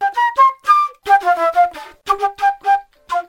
flute, Loops Phonky

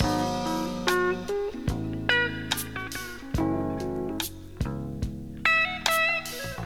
guitar, Loops Phonky

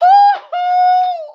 woo hoo; ooh ahh

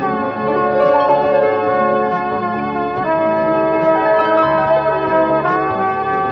horns sultry and smooth, Phonky loops

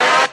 noize, stabs

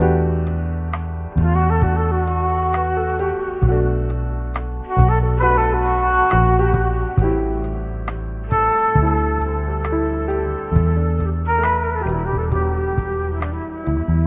flute, Loops

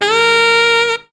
sax; Winds

